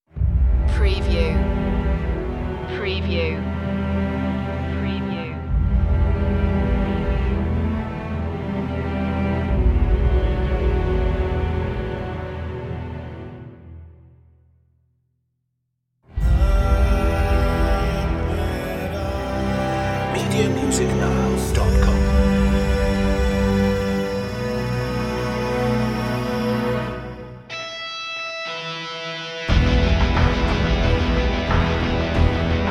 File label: rock mix